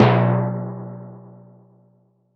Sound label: Timpani, Percussion